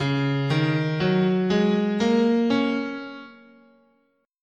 C sharp Yo, scale

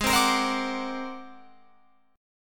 G sharp 9th Flat 5th G sharp Guitar Open F; G#9b5 strummed; JGuitar

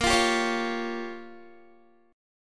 A sharp Minor 6th A sharp down Guitar, chord; A#m6; strummed

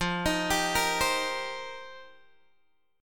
F 7th Suspended 2nd Suspended 4th, Guitar Open G

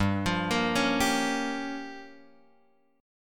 G Minor 7th Flat 5th, Guitar Open F; Gm7b5 arpeggiated; JGuitar

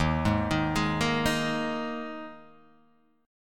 Eb Suspended 4th Eb; Ebsus4 arpeggiated; JGuitar

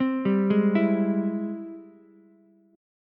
Ab Augmented Major 7th Ab Ukulele; arpeggiated; 5,7,4,7; JGuitar

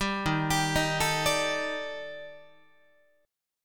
D sharp Major Sharp 11th D sharp Guitar, chord; arpeggiated; 10,11,10,13,13,13; 4A,4E,5A,5D,5G#,6C#; JGuitar

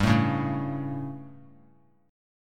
G Suspended 2nd Flat 5th G, chord

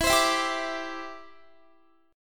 E Minor 7th Sharp 5th, down, Guitar; Em7#5; strummed

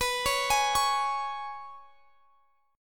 Ab Minor Double Flat 5th B

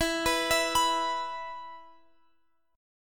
E 5th E down Guitar Open F; arpeggiated; JGuitar

